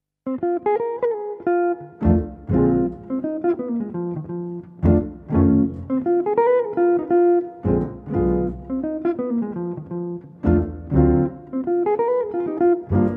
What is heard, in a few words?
Blues, Jazz Trio mit Gitarre, Piano und Bass